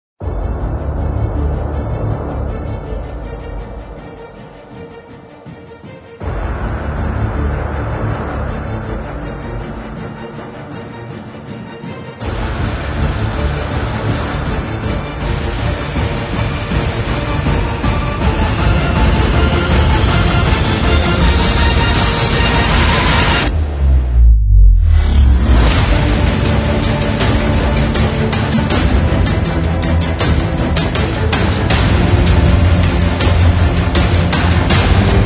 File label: Hybrid Trailer